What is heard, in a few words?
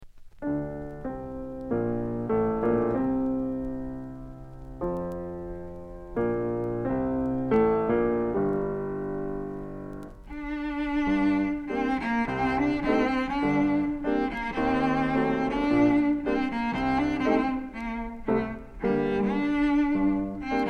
Oriental Melody